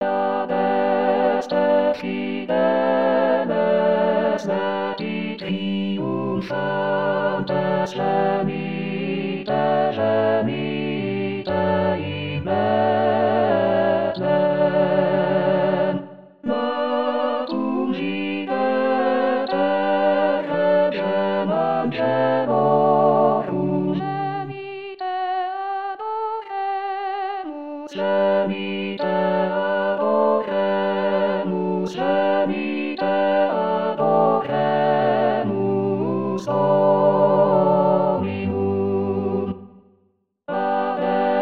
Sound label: Tutti; Adeste fideles